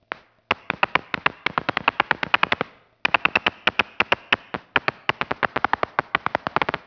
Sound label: Feed buzz